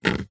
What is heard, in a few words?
wood long branch pole single scratch fingernail; foley